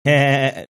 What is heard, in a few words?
heheheheheheh